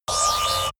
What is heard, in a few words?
concentrated laser; krieg-waffen; soundpool